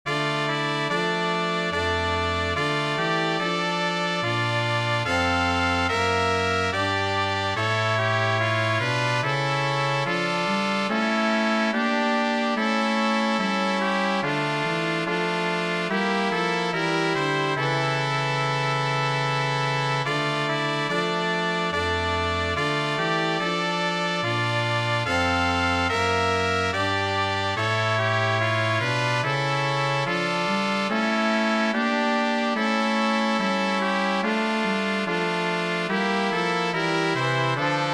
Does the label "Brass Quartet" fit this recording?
Yes